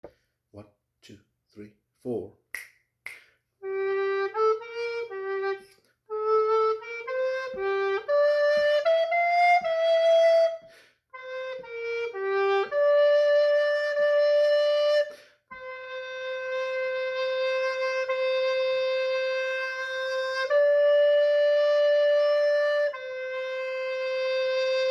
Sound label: Tenor Part H, recorder